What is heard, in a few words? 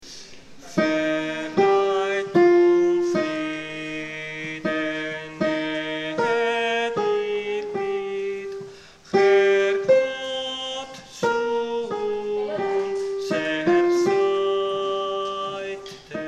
motete mendelson alto